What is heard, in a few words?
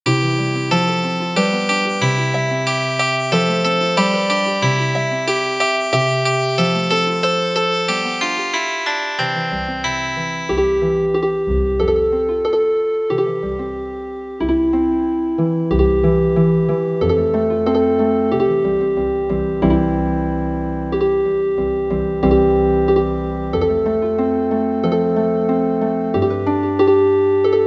whirling Healing Harp